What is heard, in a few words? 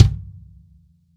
KICK, GM drum set; AKAI S-Series CD-ROM Sound Library VOL-8